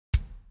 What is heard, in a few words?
bass; Bass Drum: Example